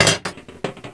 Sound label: grenade hit